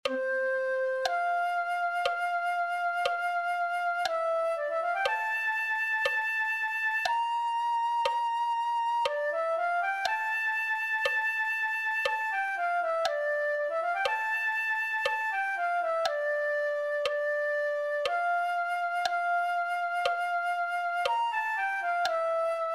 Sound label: flute